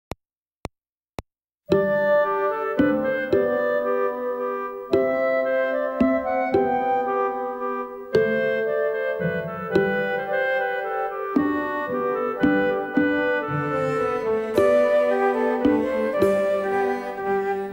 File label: minuet